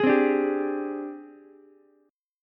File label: Ab Major 7th Flat 5th Ab, down, Ukulele; strummed; JGuitar